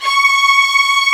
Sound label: STR STRING, Orchestral Strings